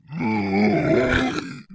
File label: zombie voice idle